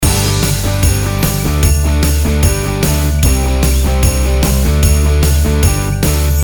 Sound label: Christmas Advertising - Loop